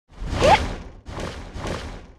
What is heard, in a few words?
HARPY ATTACK